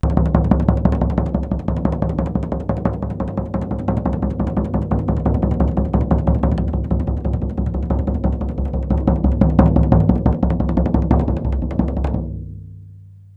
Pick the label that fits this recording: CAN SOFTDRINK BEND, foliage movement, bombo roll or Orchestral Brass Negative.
bombo roll